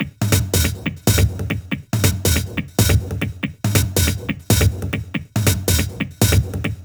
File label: Loop 140 BPM; Vengeance Samples